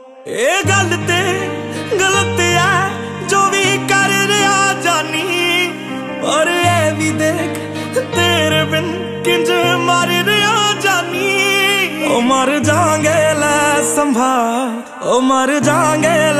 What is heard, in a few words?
Instrumental Lyrical